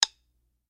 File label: Stick